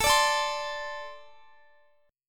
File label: A Minor Double Flat 5th, down, Guitar; Ambb5; strummed